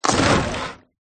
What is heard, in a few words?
harvest wood; sfgame